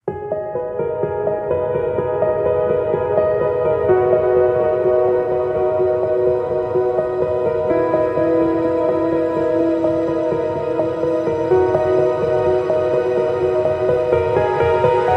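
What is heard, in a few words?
My Silence, ambient piano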